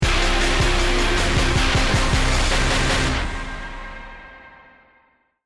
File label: deathcam